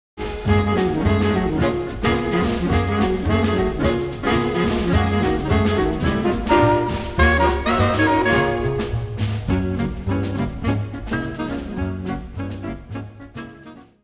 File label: Stomp